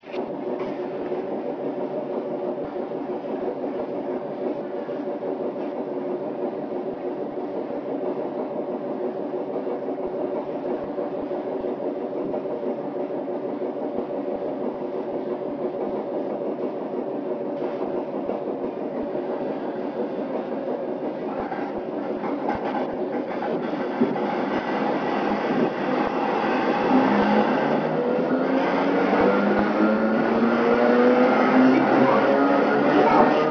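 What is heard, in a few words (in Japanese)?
大井川鐵道421系 笹間渡駅出発時の走行音; 笹間渡駅出発時の走行音（2001年2月11日収録）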